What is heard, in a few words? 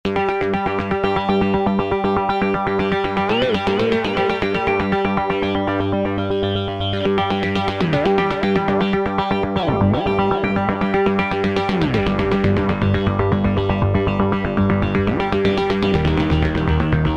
Hi-Lo Arp, Roland V-Synth; Factory Patches